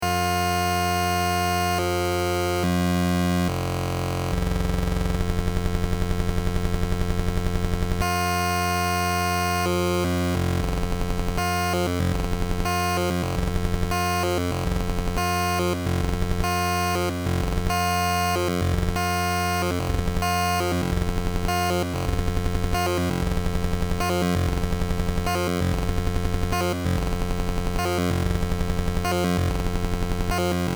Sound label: Octave VC